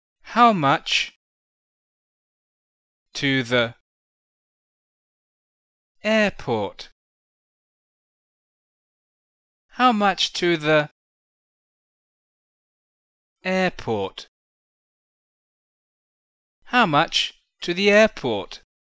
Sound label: airport